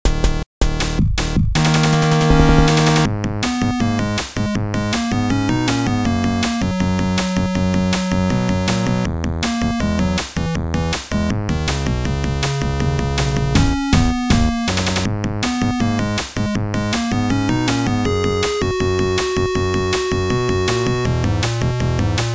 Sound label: cheese theme; SpaceForce